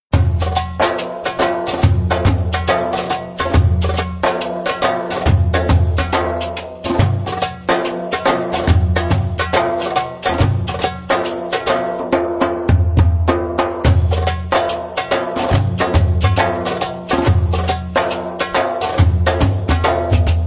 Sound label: Funky Cifte Telli (slow)